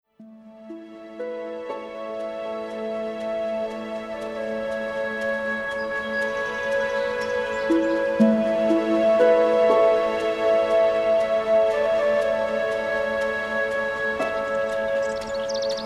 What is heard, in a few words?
pure music 7Hz